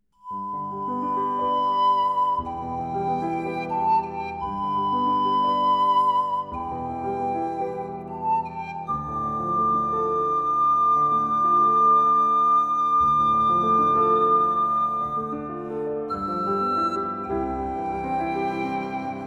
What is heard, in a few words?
recital